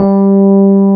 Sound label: SUITCASE, Keyboards Old School; USB Soundscan vol.09; AKAI